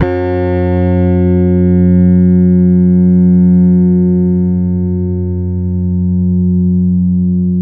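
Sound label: finger G 3, 8-string bass; Spectrasonics; Bass Legends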